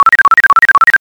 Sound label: tone; cuddle n care